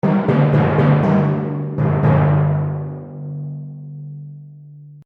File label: War Drums Stinger; Stinger Sound Effects